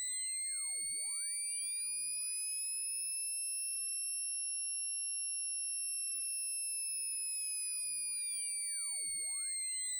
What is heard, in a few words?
mixed oscillator; cool edit